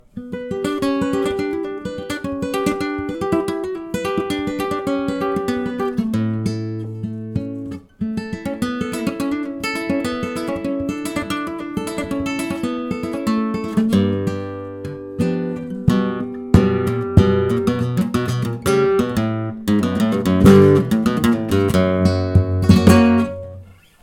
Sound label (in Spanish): falseta